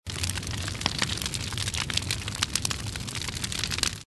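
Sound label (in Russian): zvuki kostra, campfire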